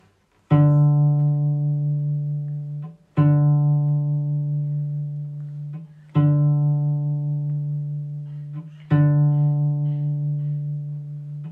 Cello pizz; Bruder Jakob

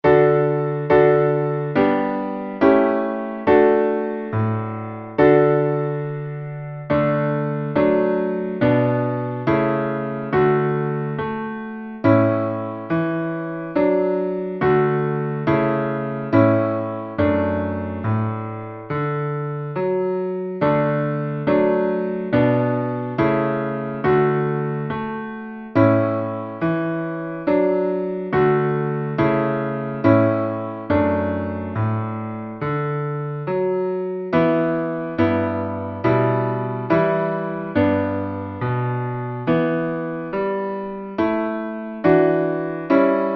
langsam Klavier

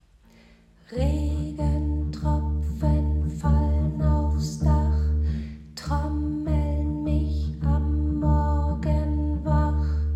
Regentropfen falln aufs Dach pizzicato Tempo 93 Bass

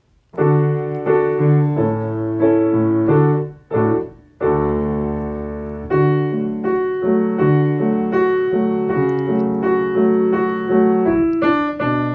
Klavier pizzicato

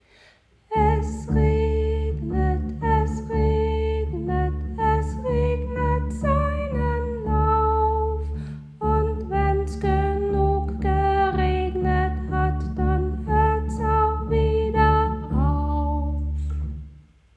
Es regnet es regnet Bass pizz

